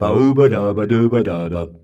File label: BAUBADADA, MALES, Hyper Dance; Best Service